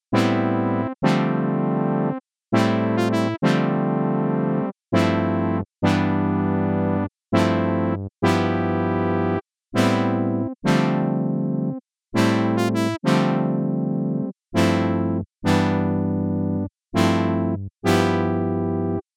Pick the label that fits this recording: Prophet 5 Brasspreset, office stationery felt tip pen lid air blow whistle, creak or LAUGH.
Prophet 5 Brasspreset